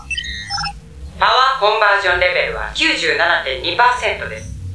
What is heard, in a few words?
Power